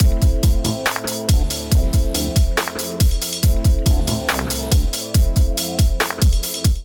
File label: Cooked Kit groove 70 bpm, Beat Tools; MIDI Clips; Ableton; Factory Packs